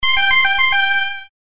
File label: CHIME; pictcode